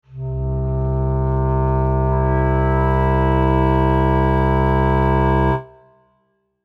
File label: clarinet